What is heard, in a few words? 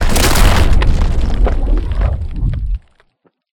meteor break open